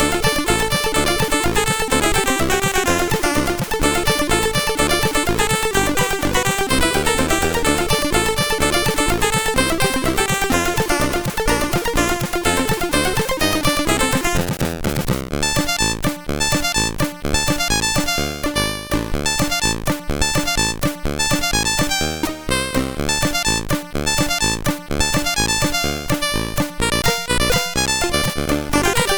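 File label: MOS 8580R5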